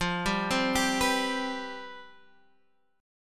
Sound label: C Major 7th Suspended 4th F